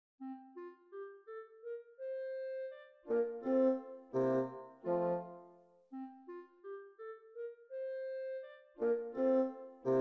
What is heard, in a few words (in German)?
Fagott